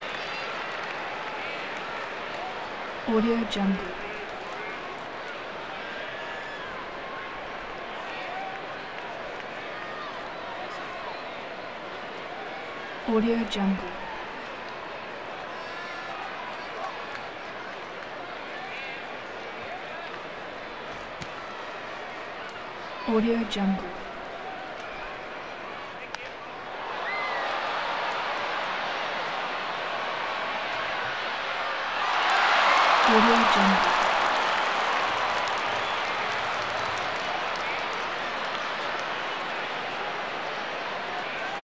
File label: baseball crowd kid squealing chatter idle